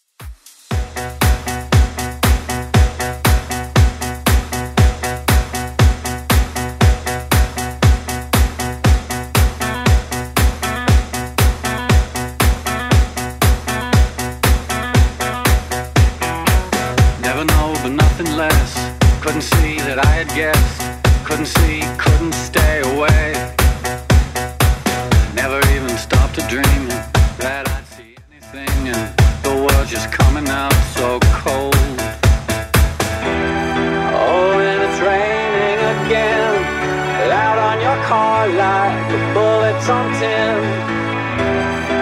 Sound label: 118bpm; Redrum